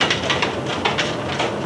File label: wagon; EnvSound